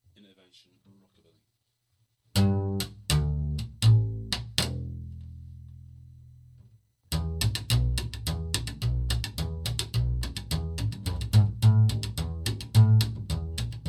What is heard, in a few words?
Innovation Rockabilly